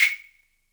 wood, Univox SR-95

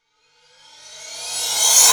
Crash Rev, Drum Hits; Zero-G; Soundclash

